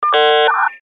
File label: modem